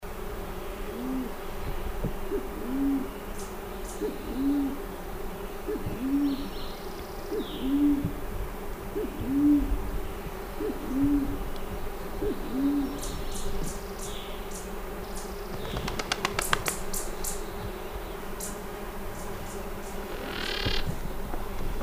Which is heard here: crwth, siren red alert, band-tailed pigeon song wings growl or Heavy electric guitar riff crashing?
band-tailed pigeon song wings growl